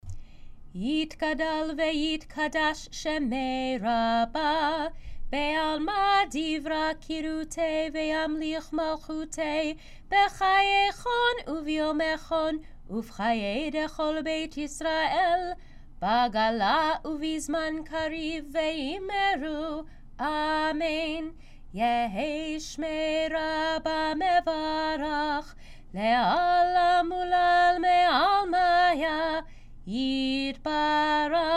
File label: kaddish shalem